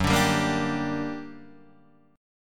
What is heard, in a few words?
G Minor Major 7th, Gb, guitar chord